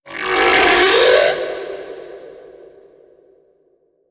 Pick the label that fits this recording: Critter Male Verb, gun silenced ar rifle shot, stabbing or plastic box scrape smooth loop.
Critter Male Verb